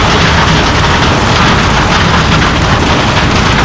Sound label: gravel skid wet, Tires; Kart; AOS RF1 Kart Demo